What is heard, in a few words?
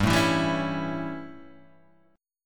A 7th G, chord